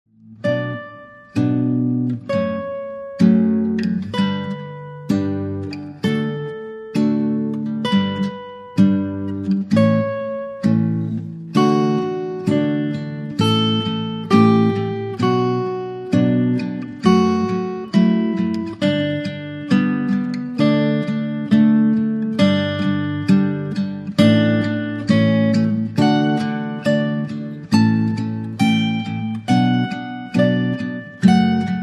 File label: Cannon in d; Avatar Cerenony